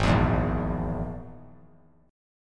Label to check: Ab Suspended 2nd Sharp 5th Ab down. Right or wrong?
Right